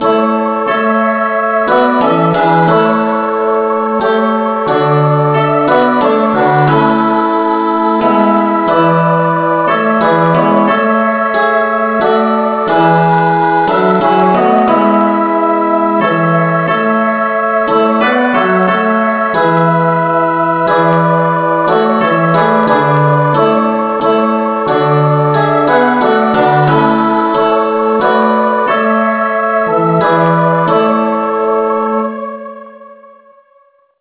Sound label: no vocal key A